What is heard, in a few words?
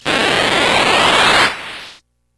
sweep